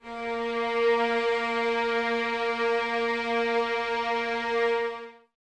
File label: A#3, String Ensembles Violins; Miroslav Vitous; 11 Violins